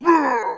fall; monster; gupae

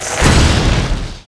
explo; monster; ice snow witch

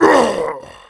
fall; fire knight

